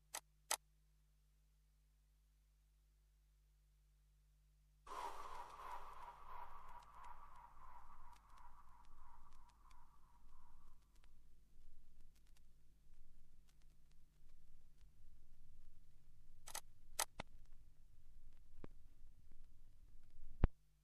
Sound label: Drum and Bass; UK Bass Radio